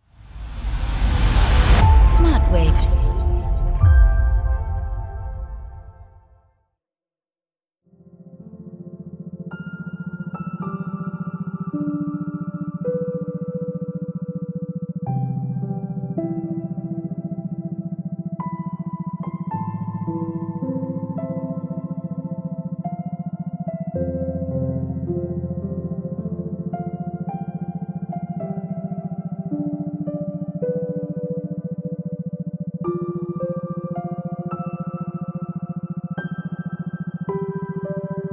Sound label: Focusing Frequencies